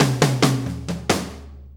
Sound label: 4-4 Fill, Drum Loops